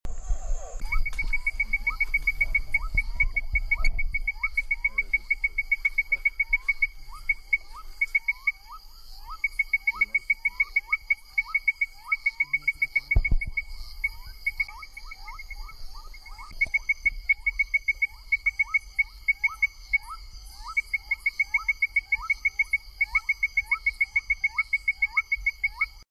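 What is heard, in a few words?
Leptodactylus mystacinus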